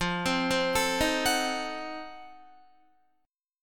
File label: F 7th Flat 9th F down Guitar; F7b9; arpeggiated